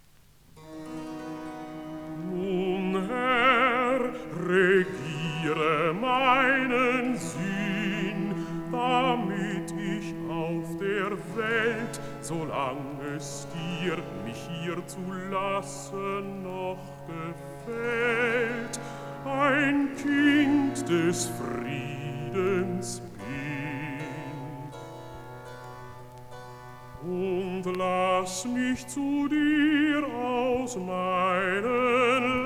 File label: Recitativo